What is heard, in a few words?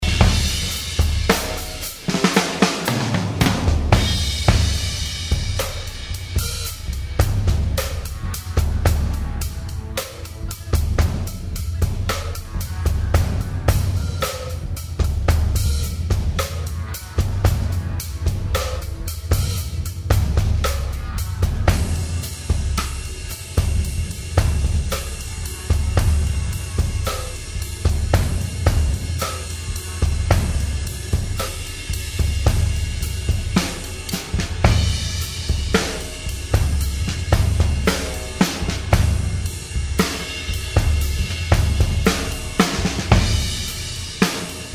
Drum outtakes